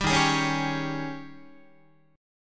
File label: Eb 11th Guitar, Eb11 chord; strummed; JGuitar